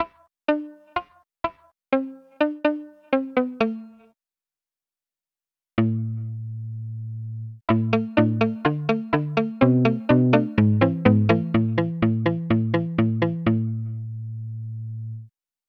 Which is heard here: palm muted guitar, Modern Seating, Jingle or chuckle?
palm muted guitar